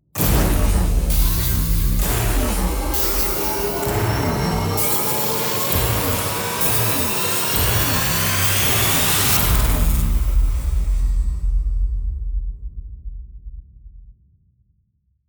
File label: MAX METAL RISE SEQUENCE; WNF ANALOG LIBRARY